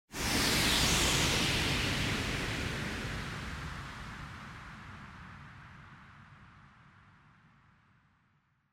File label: Motion Speed Lines Long